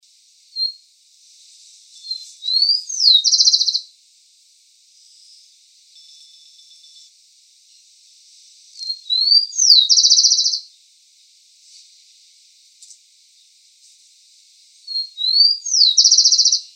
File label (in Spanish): CHINGOLO